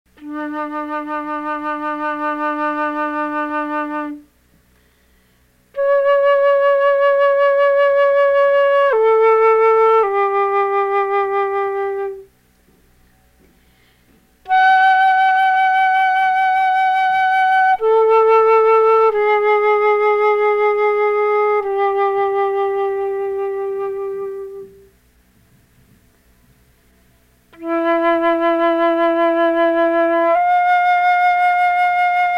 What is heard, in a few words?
Flute solo